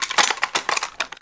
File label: Gun Weapon Pickup; SFX Kit; Pickups